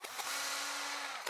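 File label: Mounted-Camera Photo Print Reverse; viewfinder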